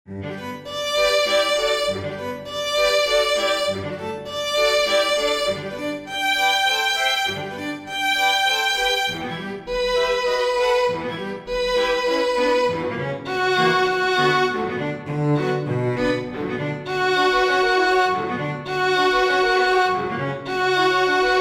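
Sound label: prélude for string quartet; saint saens camille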